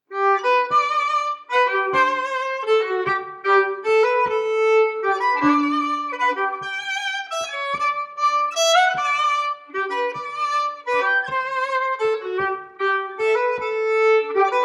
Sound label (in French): Valse